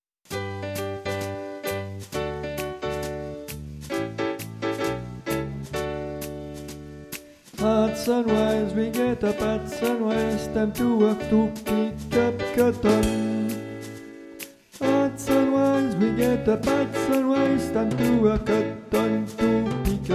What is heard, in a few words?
tenor